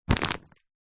household plastic pillow or duvet bag grab pick up